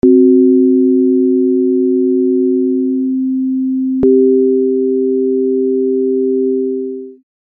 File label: dissonant; intervals